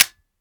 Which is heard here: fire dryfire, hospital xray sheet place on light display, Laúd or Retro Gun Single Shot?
fire dryfire